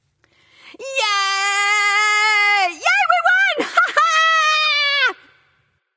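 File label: yay, female; smite; voicepack; hera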